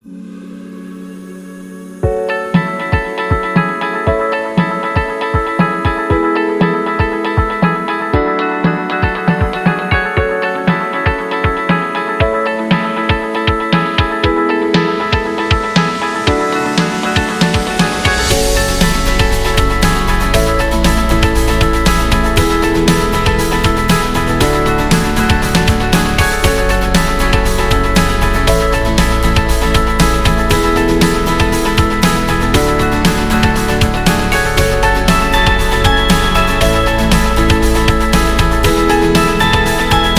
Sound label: Company Promo